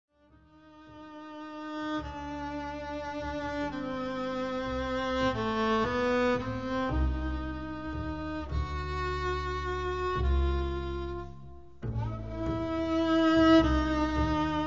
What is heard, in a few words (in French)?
valse